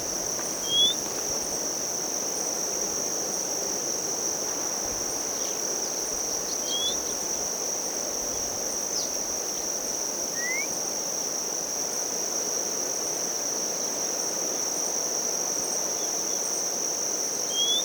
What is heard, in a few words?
white monjita; صدای پرنده کبوترک سفید